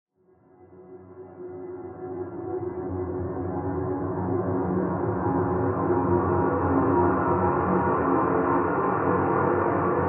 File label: Atmosphere; 99Sounds; InterSpace